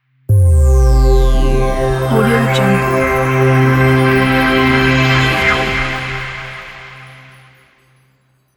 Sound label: glass fiber logo; gimo music